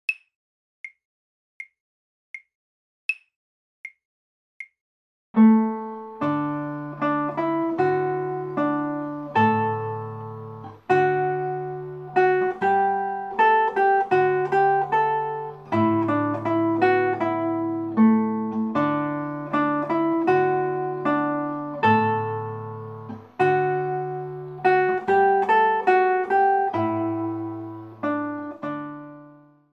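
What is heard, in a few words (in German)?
Präludium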